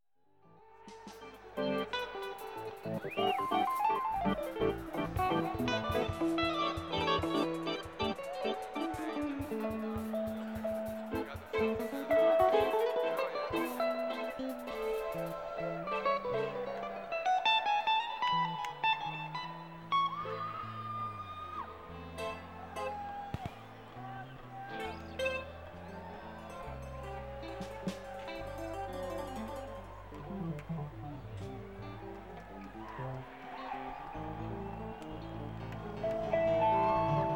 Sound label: Tuning